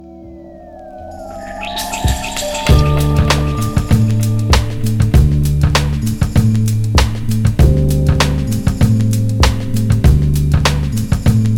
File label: ocean beat